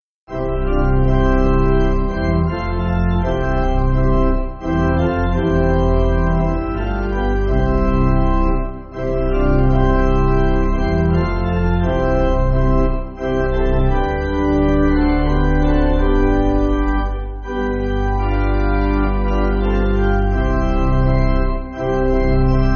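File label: Pipe Organ, church backing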